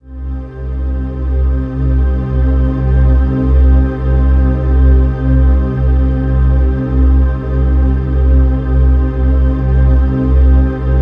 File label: tension, ethereal atmospheres; Soundscan - Ethereal Atmospheres